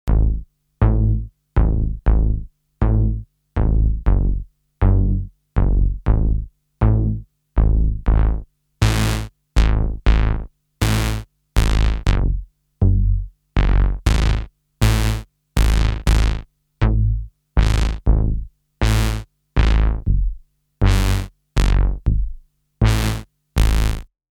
Bass Expression

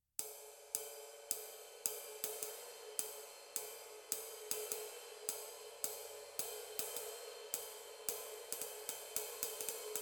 Tradition Flatride

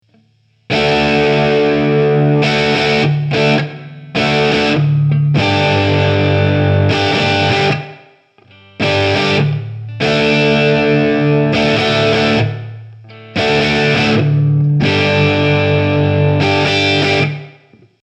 TC8210 Studio Live Room

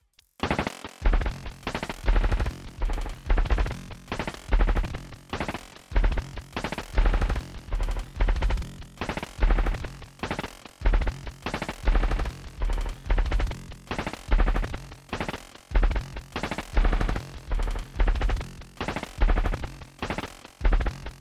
TC2290 DT Drums Chopped

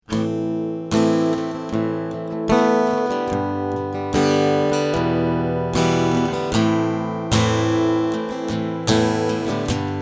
another strumming

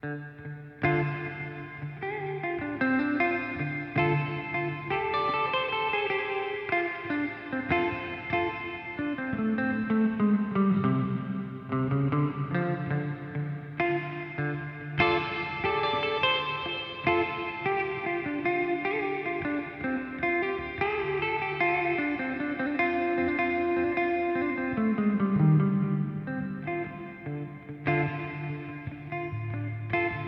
Boss GT-1000 Core Reverb Plate; Vergleichstest

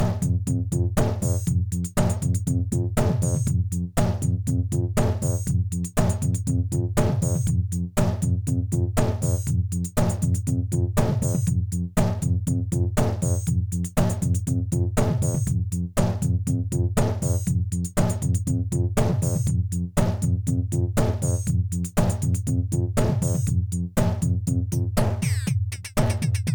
Spaze Drum Line

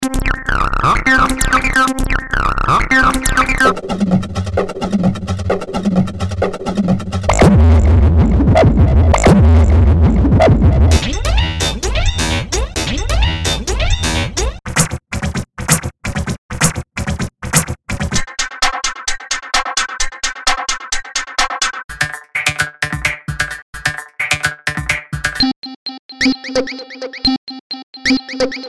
Loops

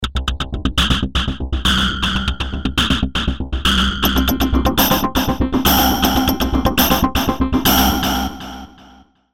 Drum Attack

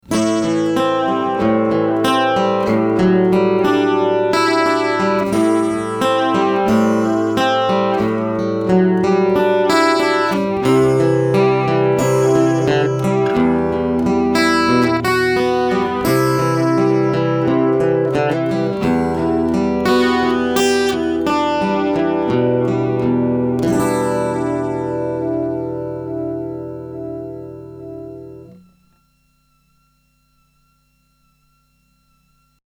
Chorus Depth Full Speed 0

